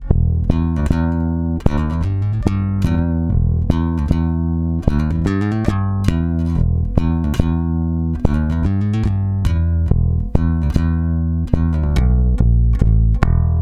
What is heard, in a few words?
STOCK SQUASH Bass Comp 54MKII; Stock Golden Age Comp-54 MK II: SQUASHED BASS